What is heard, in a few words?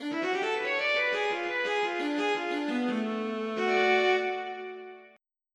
viola; Roland - An Invitation To The Roland World Of Music